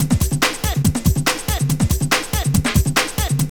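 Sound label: drum loop; X-Static Goldmine 3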